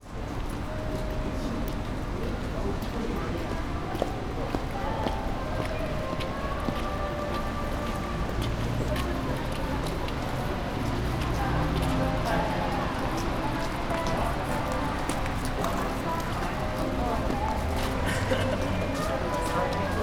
ekimae square, Fukushima

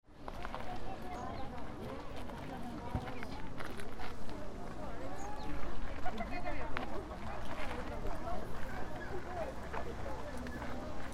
parking cherry blossoms; shinobuyama

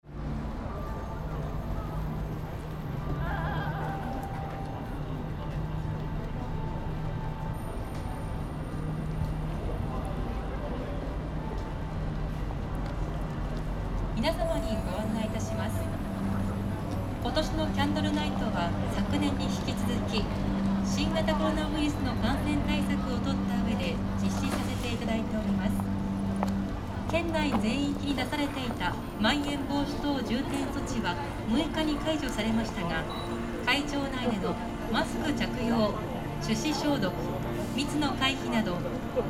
fukushima station square candle night

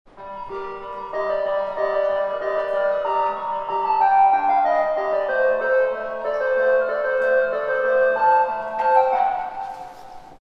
chime; fukudai; チャイム （その２）; 悪名高き福島大のチャイムが，更なる進化を遂げた．

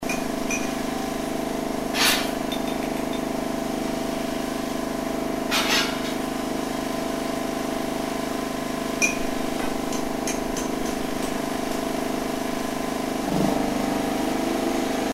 pipe construction; 工事; 昨今，工事が多い福島大．ただいま暖房用配管の改修中．

